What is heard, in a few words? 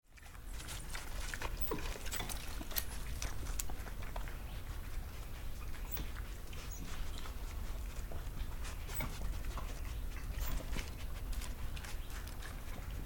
coatis